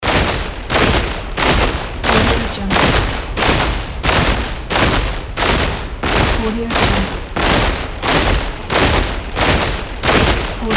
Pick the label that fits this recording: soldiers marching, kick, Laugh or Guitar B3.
soldiers marching